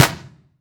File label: long snare drum c key